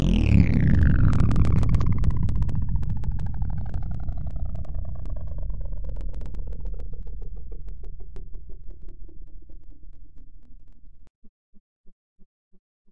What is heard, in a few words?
evil slowdown, Synth